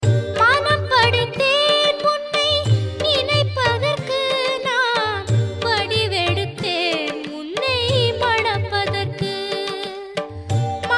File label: Tamil Devotional